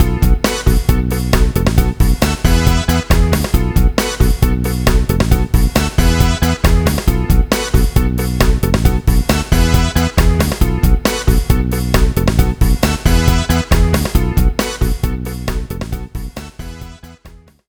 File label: Fuzzy